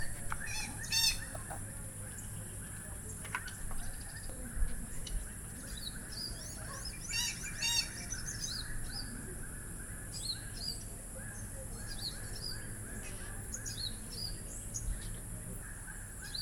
Long tailed Parakeet Psittacula longicauda longicauda